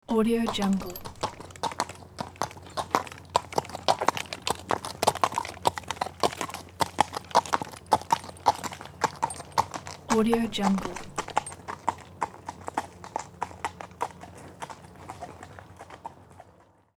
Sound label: single horse walk by on pavement; sound ideas